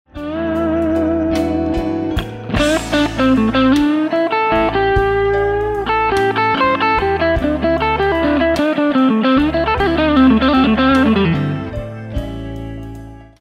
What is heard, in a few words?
Blues Lick, guitar; blues method licks and solo; Blues Guitar Method 1